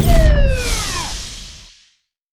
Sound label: overdrive; LoL; blitzcrank skin08